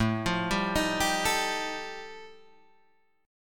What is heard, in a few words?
G 7th Suspended 2nd Sharp 5th A chord